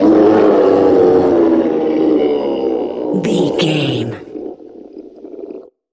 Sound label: troll monster death low